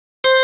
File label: GUITAR 130 BPM delay; SONIC ELEMNT; SONIC EL YES